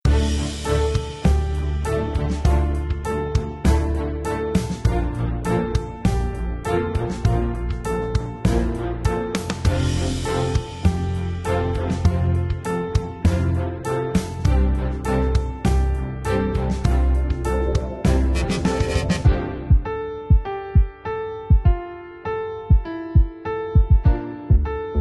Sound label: Loop